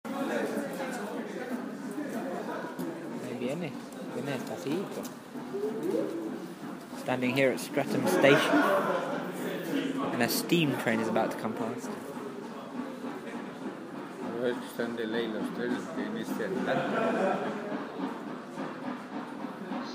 a steam train passing my local station

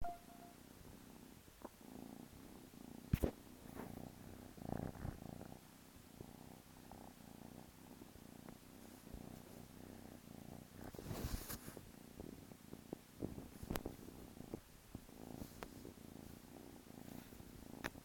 furry purring

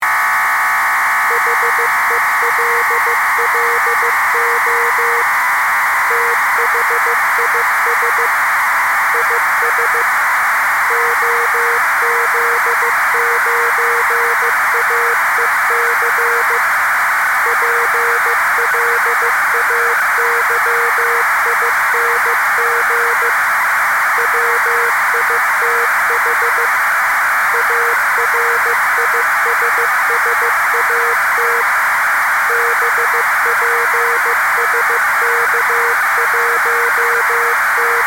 bpsk 1000 data transmission with cw message; rissat

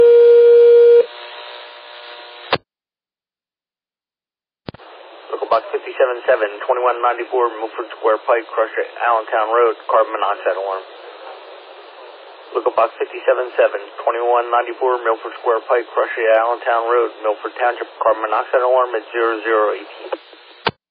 alert page; milford

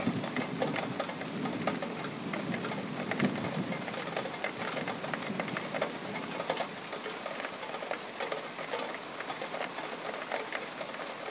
london thunder storm

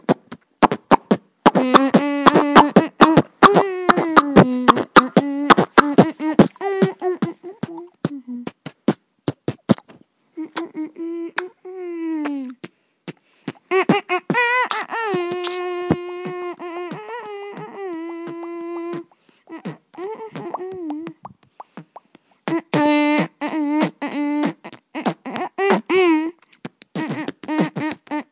beatbox

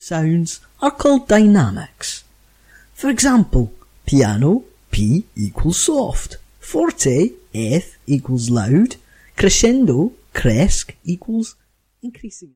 snippet from piano tutorial

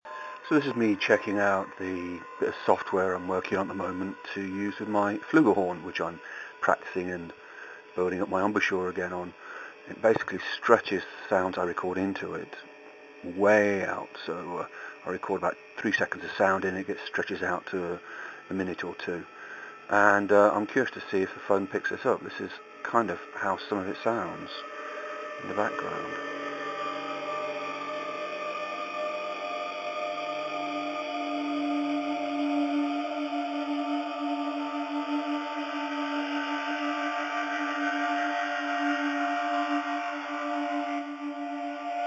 flugel

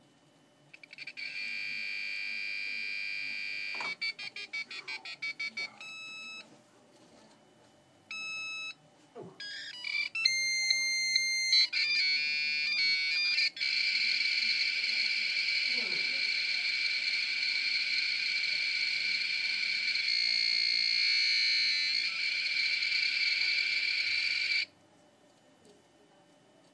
using the world wide web nice beeps; at my father in law's house

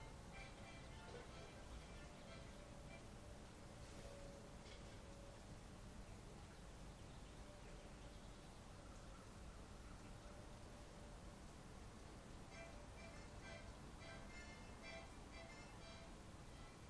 neighbor harmonica

